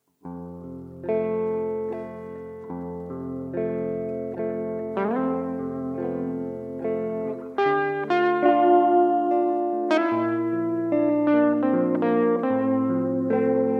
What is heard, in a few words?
(Instrumental)